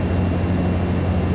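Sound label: Engine low; hover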